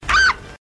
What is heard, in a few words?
scream